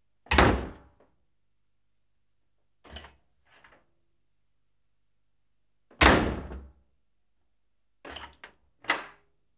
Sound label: Front Door; Halloween Sound Effects